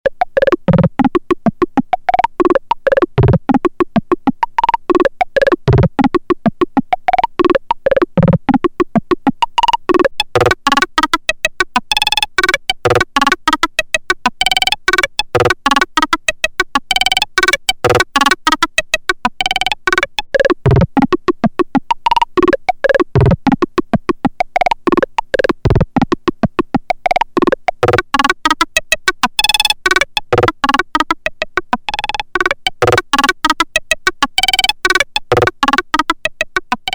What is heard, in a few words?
gated snappy, Quad EG